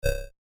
Rollover, Short

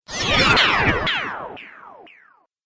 Robot Hyperspace, sci-fi robot sound effect; Robot Sound Effects

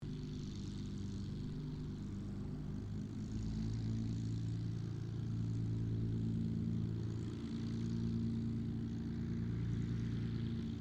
AIRCRAFT SUPERMARINE SPITFIRE MK-XVI TAXI DISTANT; Airplanes; Vehicles; Airplane Sound Effects

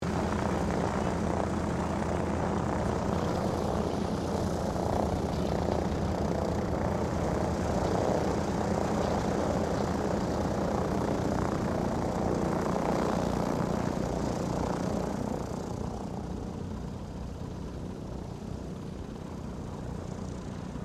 AIRCRAFT DOUGLAS DC3 TAXI DISTANT; Qantas 1942 DC3; Airplanes; Vehicles

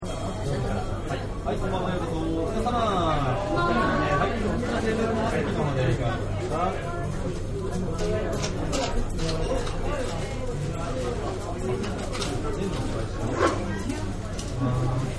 Japanese Restaurant; Restaurants; Backgrounds

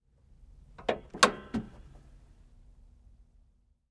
Truck GMC Savana Hood Moves; 2010 GMC Savana; Trucks; Vehicles

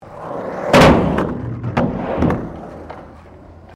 SKATEBOARD HALFPIPE WOOD; Skateboard sounds; Skateboarding; Sound Effects:Sports

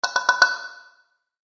Metal Hits Rapid, impact; impact sound effect

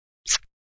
Knfe Out Flsh, A knife pulled out of flesh; Stab And Slice Sound Effects; Combat

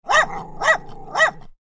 dogs x2 barking small; Animals; Dogs Barking (2X Small); McKinney Sound

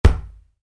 Soccer Ball: Kick Ball; Football; Sports; Soccer Sound Effects; Soccer (Sound Effects:Sports); Stuart Duffield

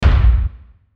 Hit Drum Deep, Hit Drum Deep Abrupt; Blastwave

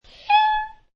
Cat Meow, Domestic cat sound, meowing, miaow; Lynne Music

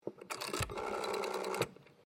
TELEPHONE WOODEN AUTOMATIC DIALING WALLPHONE DIAL; Various old telephones; Electronics

